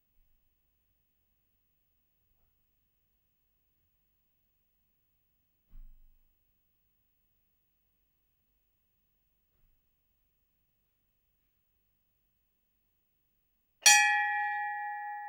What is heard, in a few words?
worship Indian religious temple bell; Temple Bells; The AudioVille